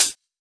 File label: Closed HH